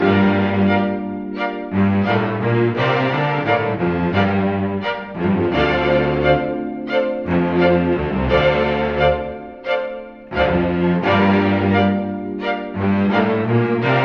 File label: strings, Sad